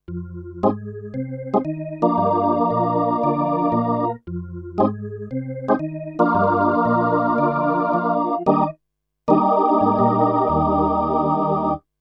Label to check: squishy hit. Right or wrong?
Wrong